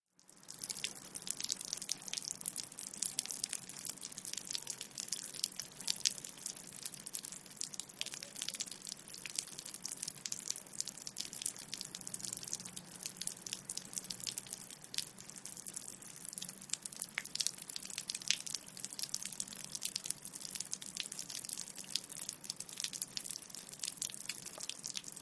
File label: Rain Fall On Concrete